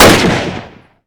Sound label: lee Enfield Shoot; Minecraft; Infamy; Last Alive Pack